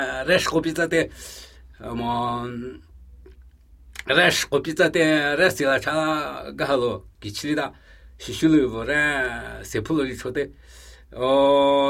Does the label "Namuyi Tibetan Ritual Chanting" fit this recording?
Yes